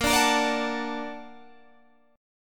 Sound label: Bb Augmented Major 7th Bb, Guitar Standard; Bb+M7 strummed; JGuitar